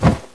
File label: snow throw; snowwars